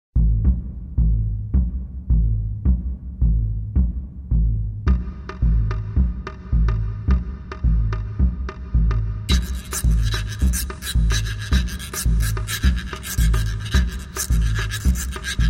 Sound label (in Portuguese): reco reco